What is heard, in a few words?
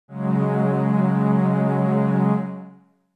F# Suspended2